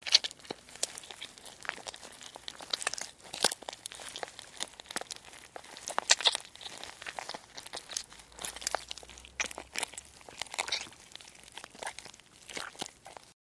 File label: Blood From Mouth, gore